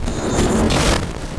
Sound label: Turntable Loop; Sound FX